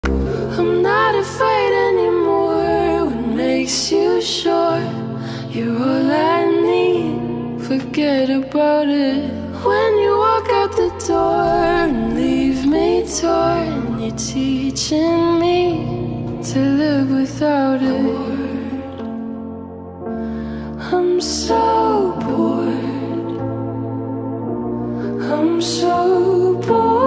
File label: slowed english ringtone